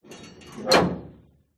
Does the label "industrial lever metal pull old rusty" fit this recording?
Yes